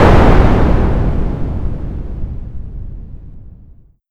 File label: explosion mix